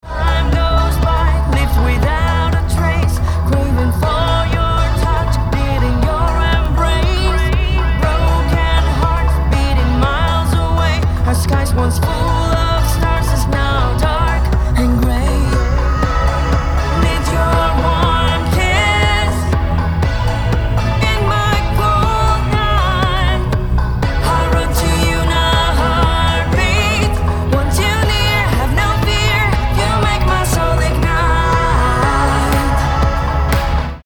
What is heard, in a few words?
heartbeat